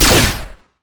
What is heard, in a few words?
basicattack; LoL; cyberezreal